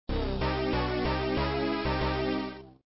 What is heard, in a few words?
boss game over; apidya